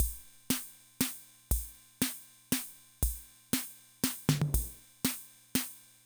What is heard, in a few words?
Loop, rhythm 55, classic machines